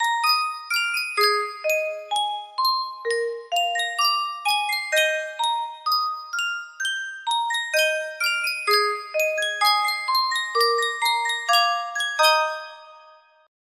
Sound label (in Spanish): Sankyo Caja de Musica